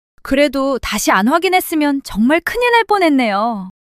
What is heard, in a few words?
surprise